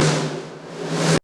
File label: REV SNARE; Back In Time Records; Big Bang